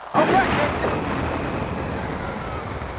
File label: eject; BERLIN55